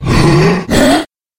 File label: monster yetti